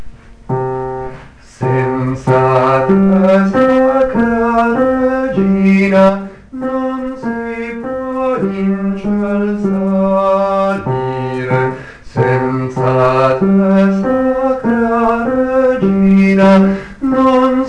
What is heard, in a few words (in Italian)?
bassi